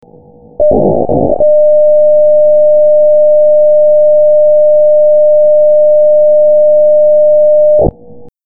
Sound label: ethernet slow